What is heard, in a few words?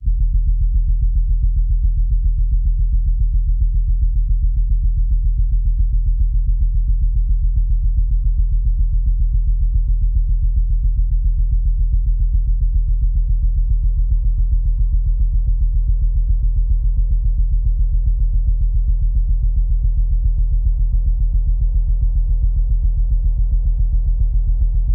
Breaking Waves